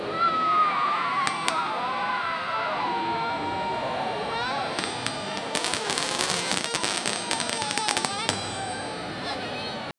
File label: environment cotton candy, carnival